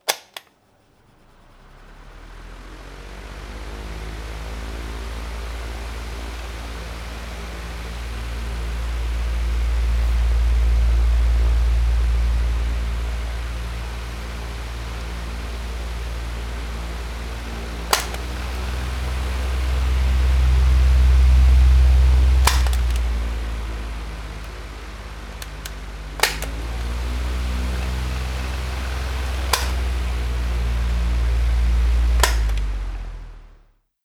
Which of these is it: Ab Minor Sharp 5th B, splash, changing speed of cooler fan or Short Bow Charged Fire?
changing speed of cooler fan